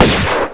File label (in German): shrotflinte; Tomb Raider PS1; lara